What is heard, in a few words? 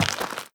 step light, footsteps, gravel